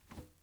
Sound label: glass jump; teardown; ps5 windows xbox series xs